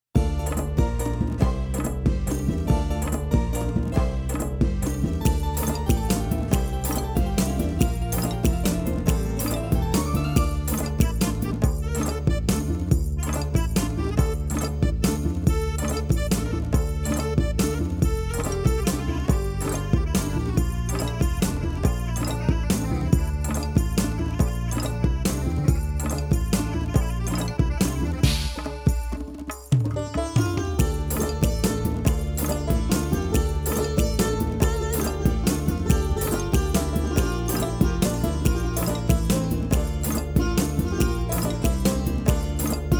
IRANIAN, yamaha psr a350 oriental portable keyboard